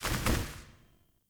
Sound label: Sparrow Death State Two Wing Flaps; Animals